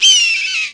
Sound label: screech; blackquill; taka